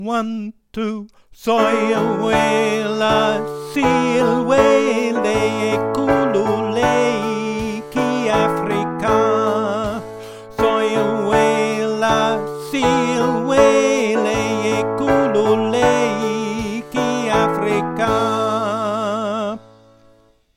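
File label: sop voice drums, soprano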